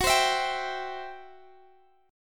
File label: C Major F sharp